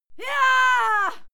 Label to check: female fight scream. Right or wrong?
Right